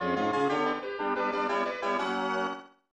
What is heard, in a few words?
Good News Jingle; Wondrous Magic 1993 SNES